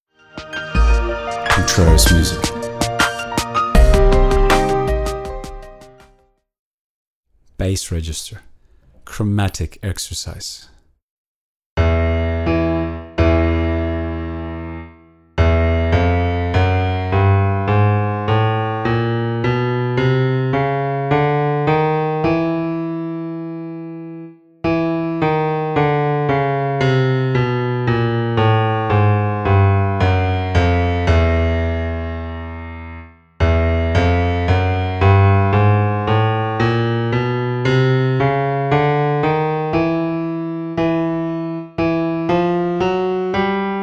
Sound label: bass chromatic exercise